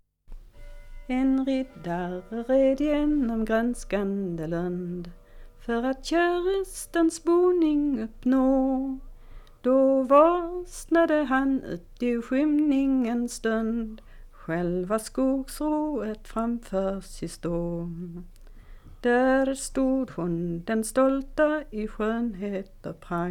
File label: Folk music of Småland; En rittare red genom grönskande lund; Ljungby horn